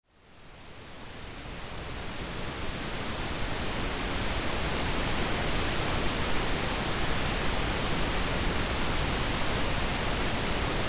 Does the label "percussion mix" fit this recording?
No